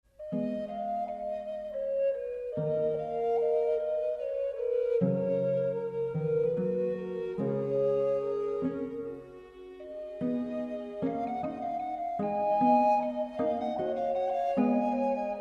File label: La harpe de melodie; Jaquemin de Senleches (rond 1382)